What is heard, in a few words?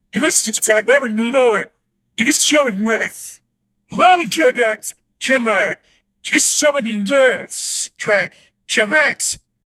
cricket player appealing